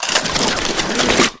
sborka amunicii posle srazheniya

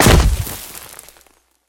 blizkij chyotkij korotkij prizhok s hlyupom v vodu

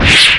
gromkij rezkij zvuk razmaha

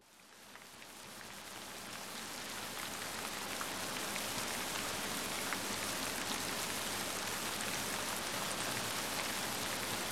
dozhd groza grom obemnij 3d zvuk

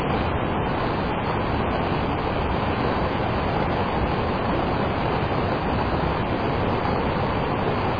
vodopad shumnii monotonnii

